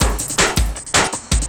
Drum & Bass, 160 BPM

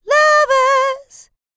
licks, vocal, dance; ILIO; Vocal Planet

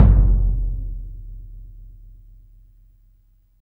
tribal drum; Drums In Your FACE!

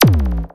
Blaster Fire, cartoon Foley Fx; musicradar; cartoon caper

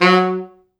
F#2, Pop Brass; Best Service ProSamples vol.19; AKAI

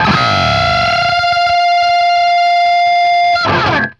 DBLE F 4 CUT, guitar doubles; East West; Steve Stevens Guitar Samples Collection

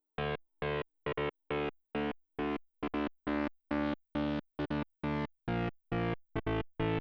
136 Midbass; Zero-G Trance Inducer; Construction Kits; 136bpm

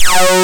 SAW SYNC, Analog Odyssey

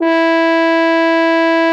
BUCK E4; Xsample VOL-3

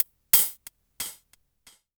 hi hat, Korg Electribe ER-1; Kit 5

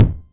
Kick, Roland MC09; Drums

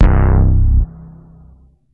Fat bass, synths

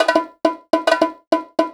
Percussion, Cinematic Funk Urban; Sonic Foundry (Sony Creative Software); Cinematic Funk Urban Tone Poems

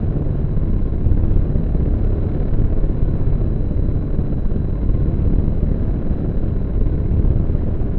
Drone Ambient; Drums & Drones

